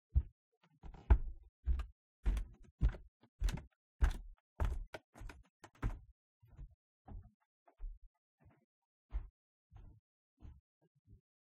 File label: Wood Walking; hello neighbor pre-alpha alpha 1